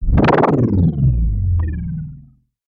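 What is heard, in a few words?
rotating bubble humming sound effects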